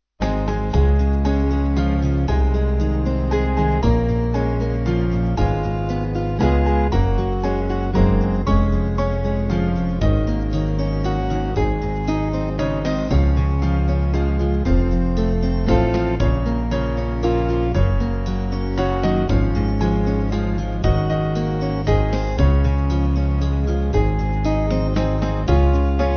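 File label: Band, Music Backing Files for Churches; Small Band; 4/Db-D; Iona Boat Song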